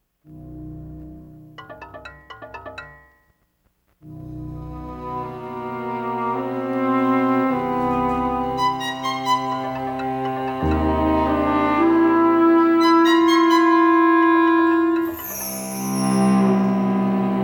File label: spooky 3 mix